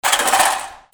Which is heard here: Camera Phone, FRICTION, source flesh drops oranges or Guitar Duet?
FRICTION